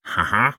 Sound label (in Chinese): 待机声; 幻术师